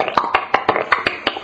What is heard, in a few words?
wheetabix, Beatbox, Drum loops